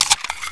left mag out, weapons

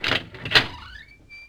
door hospital open; left4dead

